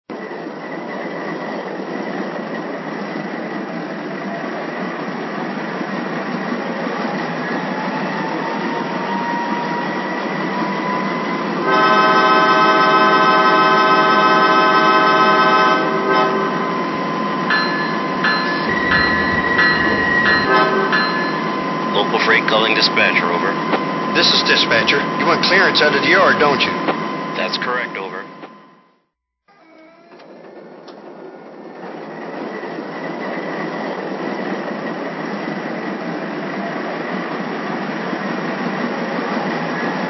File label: ES44AC